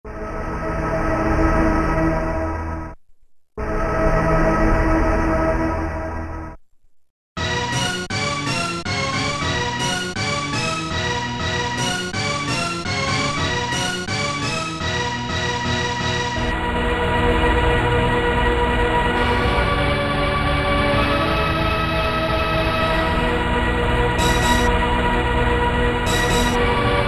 quadrophonic, sampler; Guess the sampler game

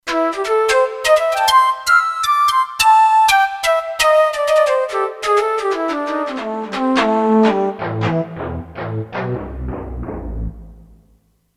Mallet Flutes, Kurzweil K2500X; Factory Programs

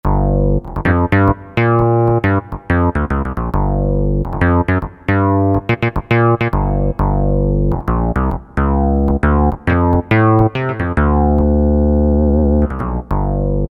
Syn. Bass 5, Roland U-220; Factory Timbres

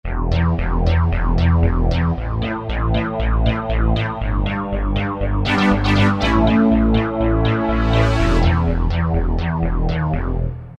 Big Swell; Factory Combi demos

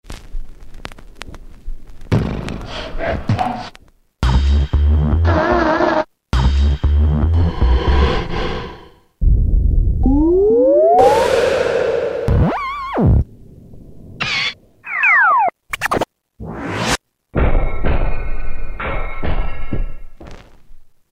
Noise Menu; Roland M-DC1

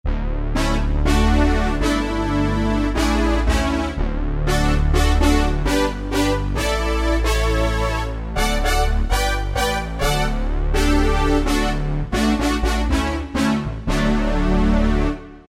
Brass, Alesis QS8; Ohbe Brass; Factory Preset examples

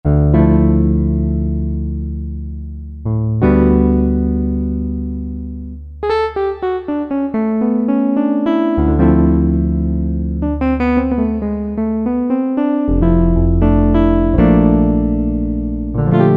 Piano; Cheetah MS6

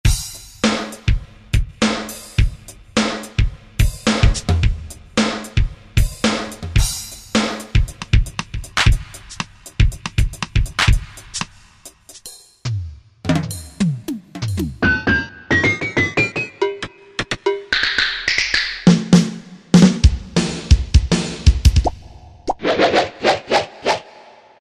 Drum, Korg M1; Factory preset examples

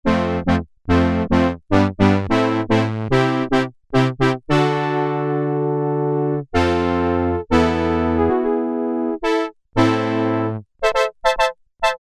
Synthe Brass, Korg Poly-800

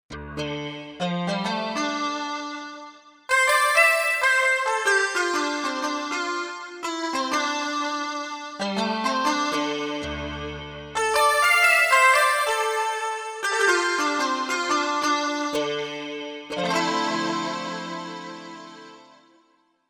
Syn ZIMBA, Korg MSC-1S; Korg MSC-1S Variety

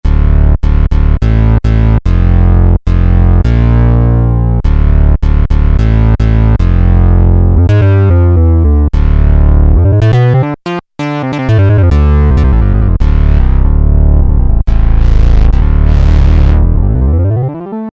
MG Bass, Roland JD-990; Mondo MG Bass; Vintage Board

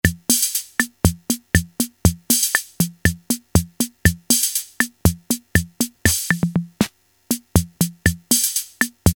beguine, Casio MT-68 rhythm

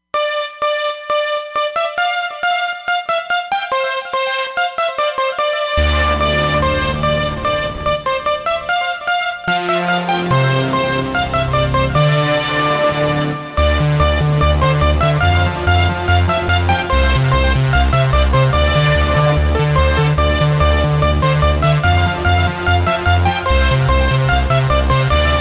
Crumble Synth, Roland Fantom XR

